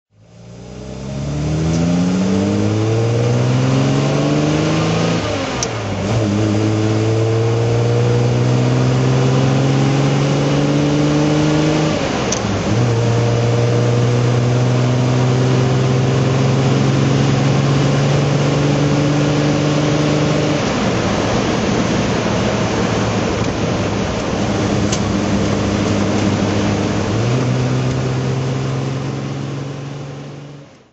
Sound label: austin healey 3000 mk2a drive